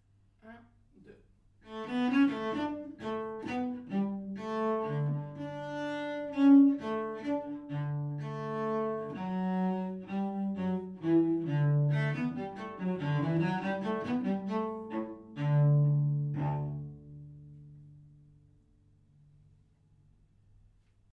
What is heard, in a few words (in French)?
Violoncelle seul; Sonate no 2